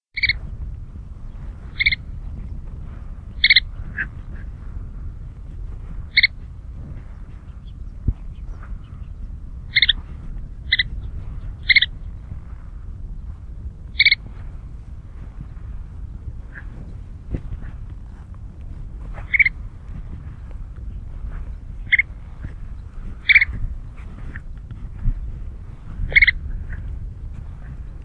Svartbent strandpipare

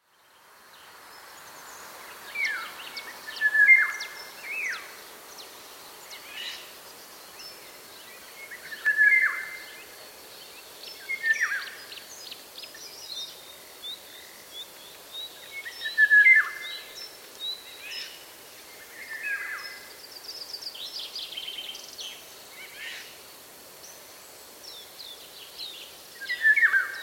Sommargylling

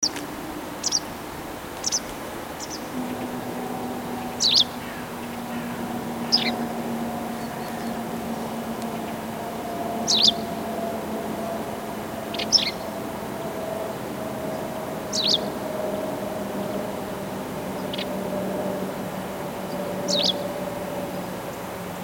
Engelsk sadesarla; Motacilla alba yarrellii; English White Wagtail; Stuart Fisher

